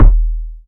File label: warm TR 808 analog kick drum D# key